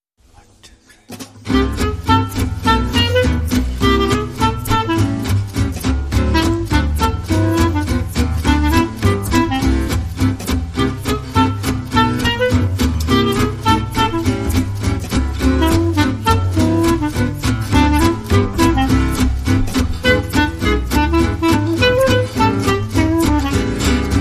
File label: Clarinet Version